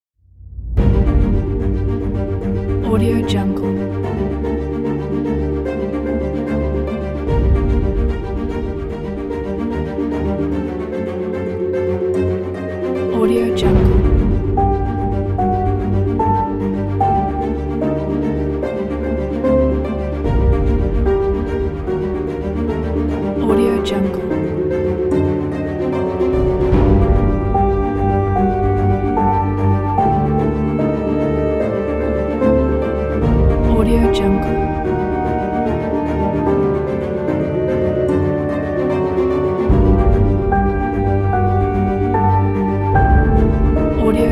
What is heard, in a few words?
suspenseful dark cinematic mystery